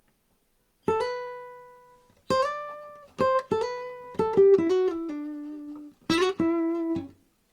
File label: guitar; accompaniment extraction